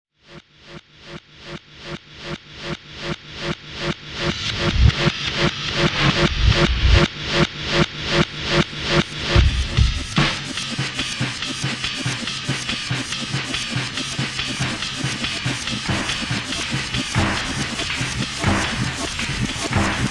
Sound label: Amen Broken